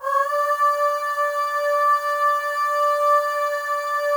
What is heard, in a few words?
AHS, FEM; Spectrasonics; FEM.1 AHS